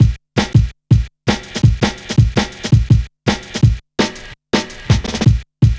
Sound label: Check A, Jungle, Drum Loops 165.5